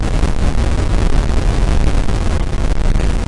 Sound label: scratch